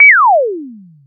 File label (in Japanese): pyu n